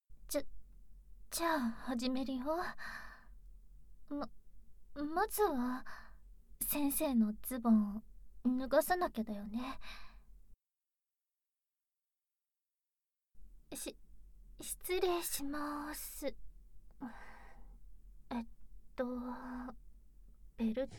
耳舐め手コキ; 美春ちゃん

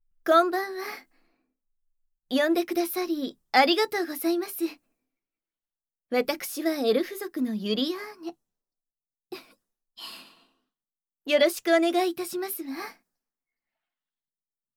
淫紋付きドスケベエルフとオホ声孕ませセックス L

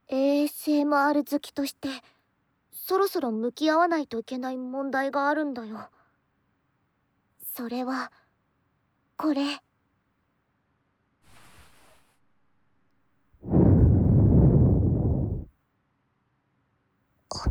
たっぷりお耳ふーふー; 『たっぷりお耳ふーふートラック』

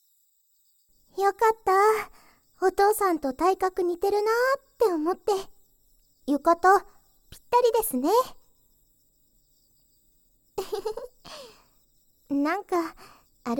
花火大会デート ディープキス, ASMR; 夏恋その参

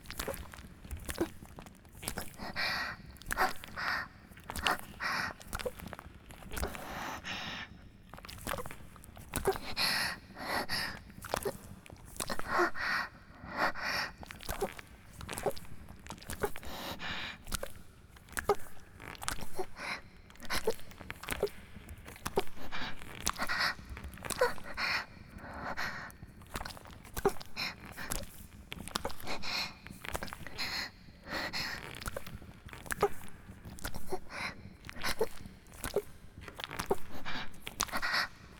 ギシアンループ; 田舎の別の無感情少女と自己犠牲愛2

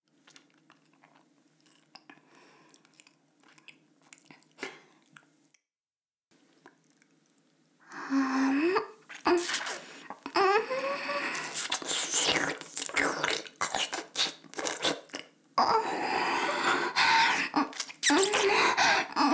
耳舐め, ASMR; 淫妖精の森; イジワルルリアゲハのソロパート

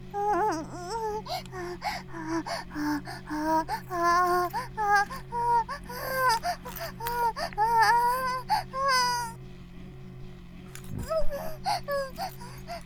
バイブ放置, ASMR

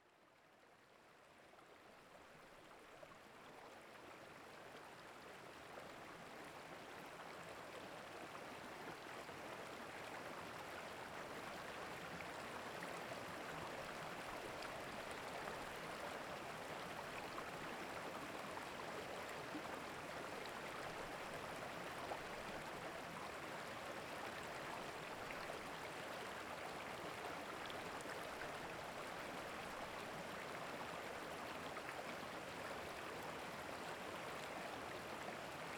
ASMR 温泉（露天風呂環境音、フットマッサージャーの音）; 滝澤温泉

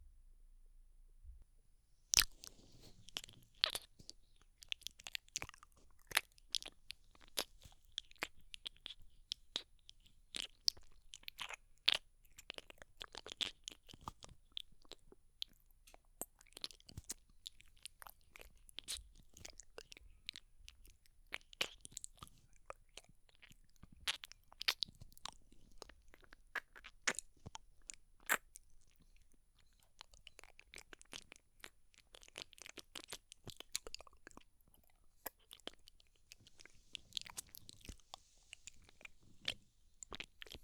耳裏を吸ったり舐めたり, ASMR; 癒やしの耳舐めワールド4

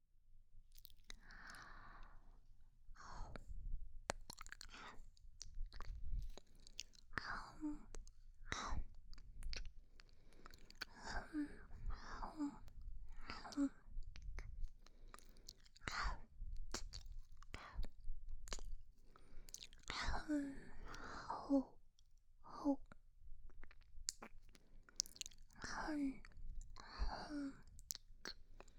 あむあむ 左

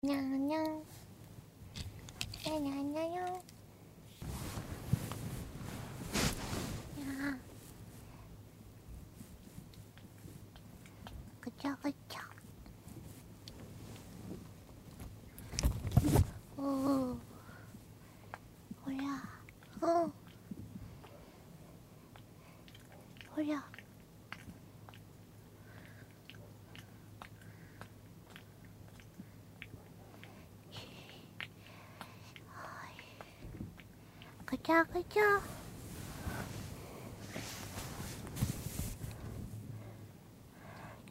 オナニー実況 ピストンできるバイブ; 【オナニー実況】ピストンできるバイブで奥をこんこんしたりごりゅごりゅしたりじゅぷじゅぷして堪能してみた

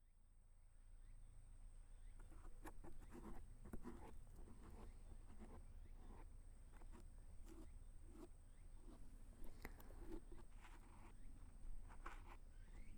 右耳かき; 守り神とふたりぐらし。 ～ささやき、耳かき、添い寝と癒しのひととき～

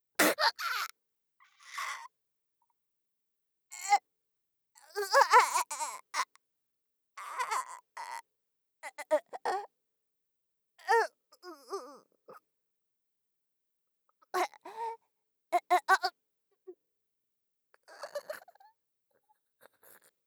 激痛で上向いてヨダレ垂らす, 効果音あり

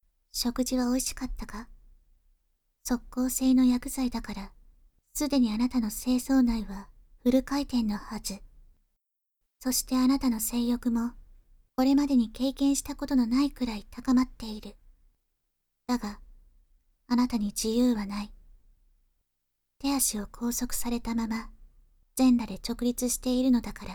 乳首責め＆オナホコキ 効果音なし; 生産工程１

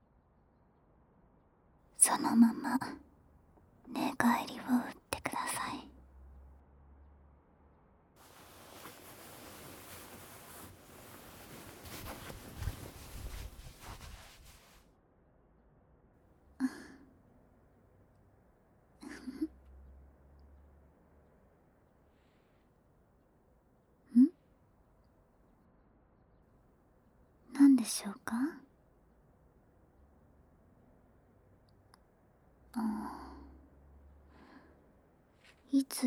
メイドの耳舐めご奉仕 耳奥響くくちゅくちゅ（左耳part）, ASMR; デレたメイドの耳舐めご奉仕♪

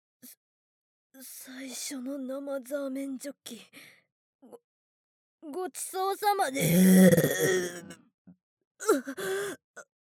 ザーメンゲップ（中）; ザーメンゲップ集

